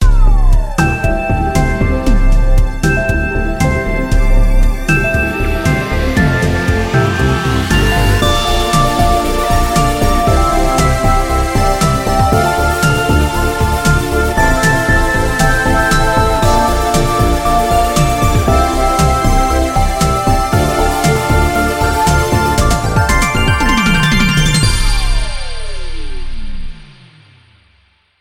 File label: Uplifting Synth Wave; MIUI14 and HyperOS